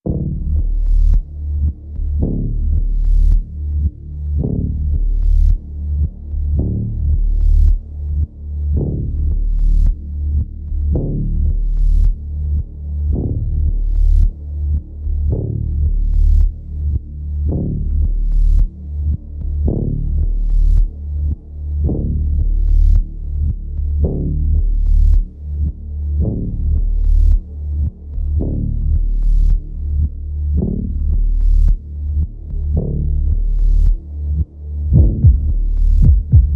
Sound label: Pulses and Heartbeat